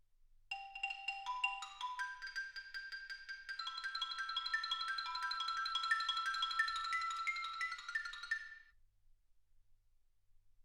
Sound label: xylophone